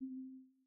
press; Mindustry

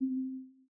press; Mindustry

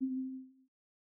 press; Mindustry

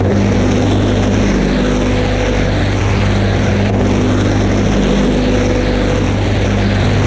beam; Mindustry

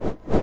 swap; Unciv

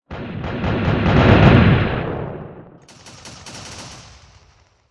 ship Cannon Volley; Unciv

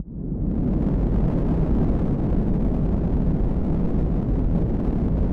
core Launch; Mindustry

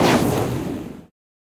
missile Large; Mindustry

